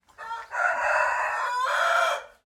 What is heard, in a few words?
Rooster Call; BIRDFowl; Farm Animals; Mechanical Wave